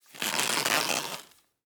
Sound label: bow stretches wood creaking ripping tearing; prosoundfx